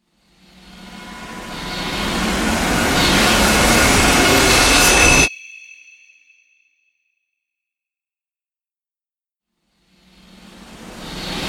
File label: scatters fast air